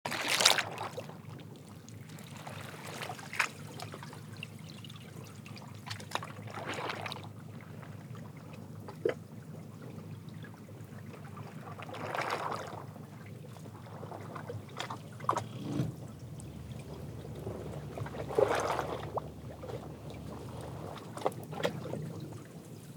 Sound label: Ice Sheet Water Lapping from Zodiac; Ross Sea 2025; expedition blog calum turner